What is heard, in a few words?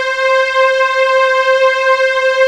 STRING, synths & keys; E-MU Formula 4000 Series Vol. 2